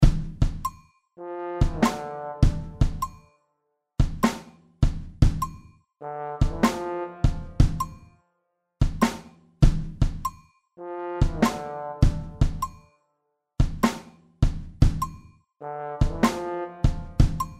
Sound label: batt agogos